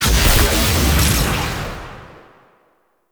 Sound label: Overload Explosion; Warframe; Volt